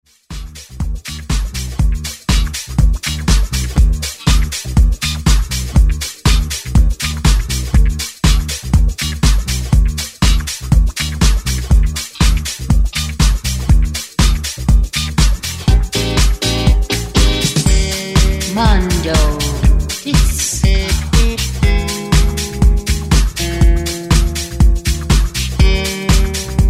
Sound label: Disco, 70s disco rebuild; 121 bpm